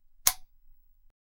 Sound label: a spotlight being switche